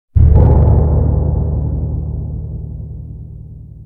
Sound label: probe return; ships comets; CosmicRage